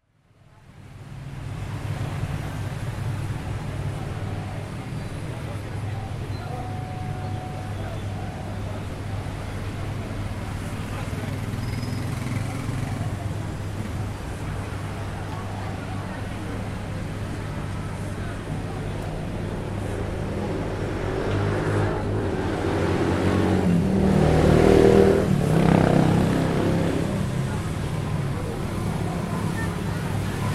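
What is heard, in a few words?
Bali atmospheric sounds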